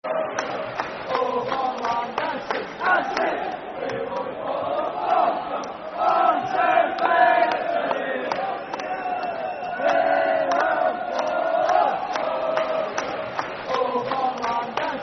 We All Follow The Falkirk Football Chant (Soccer Songs); fanchants